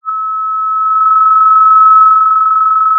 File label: pop, Sound of a phone ringing